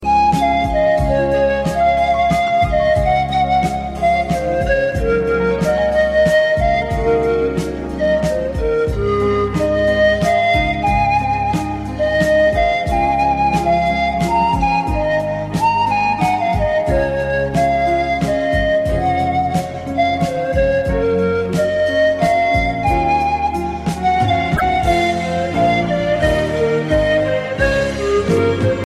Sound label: amazing flute ringtone